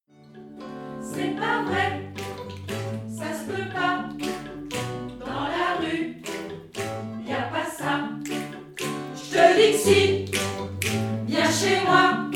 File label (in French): refrain rythme